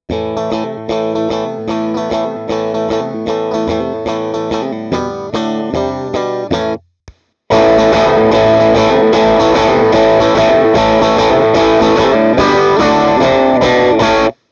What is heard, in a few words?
Guitar Pedal, treble booster pedals; Guild 1984